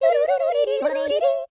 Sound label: YODEE, World Voices; ILIO; Vocal Planet